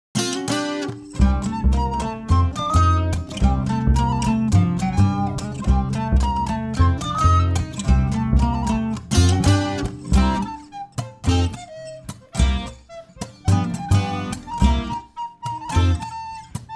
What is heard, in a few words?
INSTRU